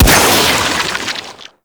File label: Infested Sniper Rifle Shot; Weapons